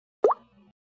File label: cute